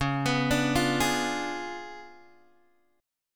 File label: C sharp 7th Flat 9th Guitar Open G, chord